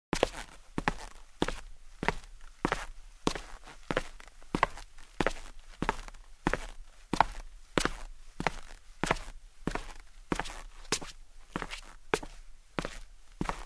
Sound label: Steps on the wood floor in shoes